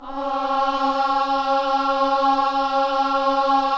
KIDS CHOIR, Gospel; Spectrasonics; Vocal Planet

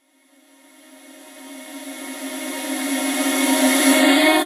Reverse Vocals D#; Effects; Vengeance; Essential Clubsounds Vol.4

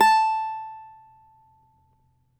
CAVAQUINHO A, Guitars; Spectrasonics; Hans Zimmer Guitars VOL-2

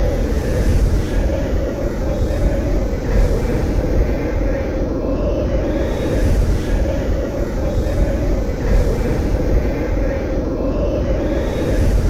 WINDFX; Best Service; Advanced Mega Effects

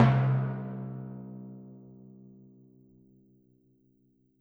Timpani, Orchestral Percussion One Shots

